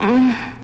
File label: um orgasmic; ooh ahh; uh um